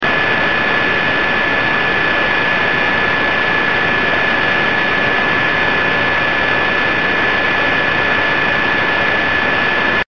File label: static